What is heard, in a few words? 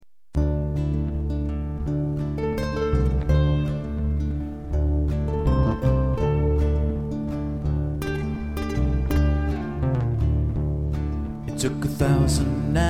narrowboat song